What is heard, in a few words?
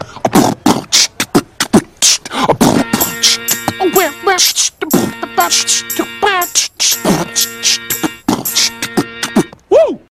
Funky and Fresh